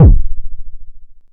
analog attacked, kick; Ableton Foundation FreePacks

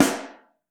SN3 Mono, Snare; Ableton Foundation FreePacks; Snare Designer

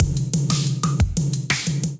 Young Blood Kit, Drums; Ableton; Drive and Glow

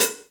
Hihat Foot Warm And Low; Drum Hits; Standard Hits; Ableton; Factory Packs; Session Drums Club

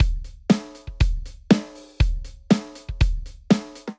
Rock Kit; Drum Kits; Ableton; Drum Booth

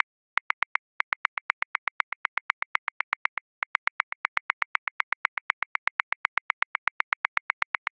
Stadium Rock, Grooves; Drum Booth; Ableton

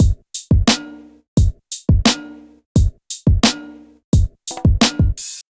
Kit, Golden Era Hip-Hop Drums; Moonroof; Ableton; Sound Oracle

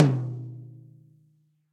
Tom Mid Steely Tight; Drums; Drum Hits; Ableton; Session Drums Studio; MPE

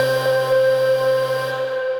Synth Keys

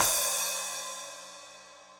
Splash 12 Dark Plate Verb; Drums; Drum Hits; Standard Hits; Cymbal; Ableton; Factory Packs; Session Drums Club

